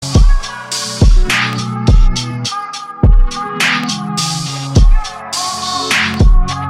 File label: short beat